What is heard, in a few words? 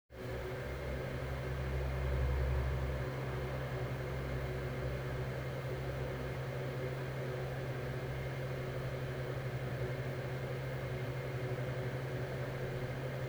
Street Pipes Tones